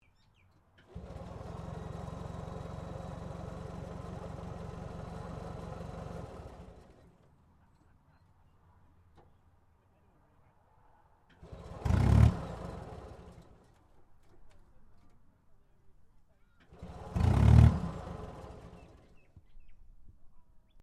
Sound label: TANK CENTURION SECONDARY ENGINE IGNITION FAIL, British Centurian main battle tank